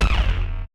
kick, Roland MC-909

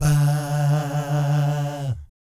VIB FX, Vocal Planet Jazz & FX; ILIO; JAZZCOMBO

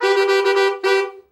A, BRASS SECT; Best Service; Killer Horns

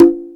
Misc Conga; Mo' Phatt; Digital Sound Factory Mo' Phatt

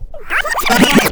backscratch, scratches; Zero-G; Freak Beats

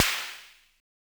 Snare, Univox SR-95

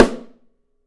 Drums, MARCHING; 3D Drums; OP MARCHING

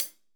12HHCLSD SR, drums hi-hats; Best Service; Real Mega Drums VOL-1

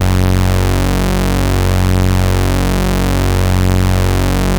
MOOG PRO, BASSES

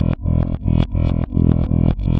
Backwards, Bass, Effected; Bass X

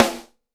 Std. Snare, Roland BK-5; Snares

